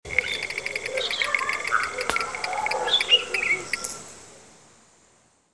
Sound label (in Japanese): doubutu